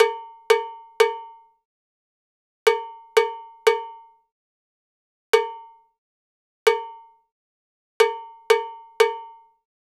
Cow Bell, Latin Percussion

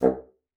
bassoon, C2; Xsample VOL-6

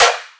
drum snare drama; Virtual Riot; Drum Hits

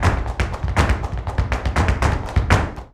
BULERIAS TAP, Flamenco

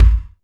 STEAK, KICKS; Back In Time Records - Big Bang

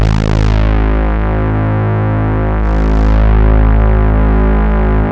MIDI, Prophet; Rubber Chicken Software Sample Bank; SCI PROPHET

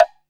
BLOCK HI, PERCUSSION

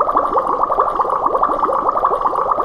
BUBBLE; SFX; Back In Time Records - Big Bang

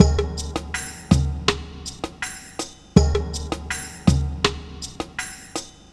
breaks, Loops; M8

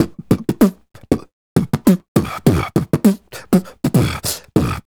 Beat Box, Hip-Hop and RnB Vocals

